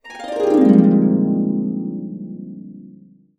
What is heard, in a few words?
BB7, Harps; USB Soundscan vol.62 - Complete Harps; AKAI